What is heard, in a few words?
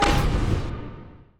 UI Counter; Monster Jam Steel Titans